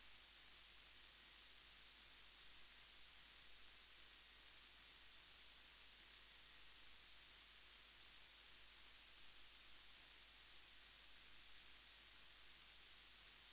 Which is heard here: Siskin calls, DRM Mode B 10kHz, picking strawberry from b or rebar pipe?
DRM Mode B 10kHz